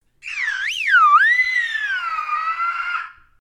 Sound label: Scream